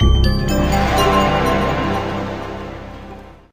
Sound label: next level; eiffelmedia; brick breaker